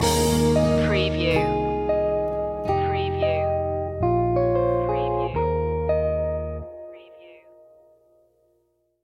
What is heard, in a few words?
joyful summer stinger